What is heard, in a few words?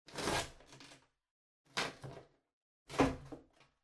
Cardboard Box Far Unbox; ダンボール; 遠い 開封; 梱包材